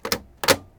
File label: disc; Monster girl quest Part1,2,3; Visual Novels